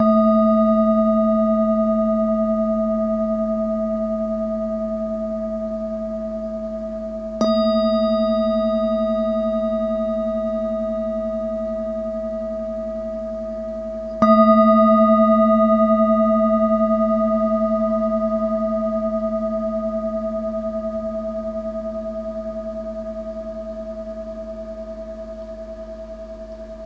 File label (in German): klangschale klein